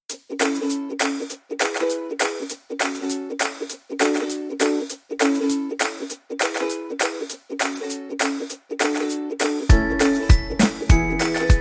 Happy Background Music For Videos Ukulele Upbeat Music